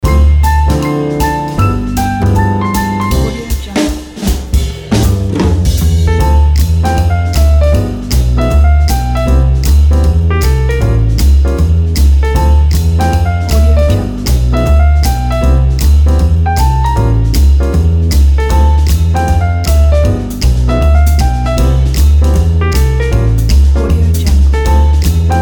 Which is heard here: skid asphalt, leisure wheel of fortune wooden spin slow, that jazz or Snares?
that jazz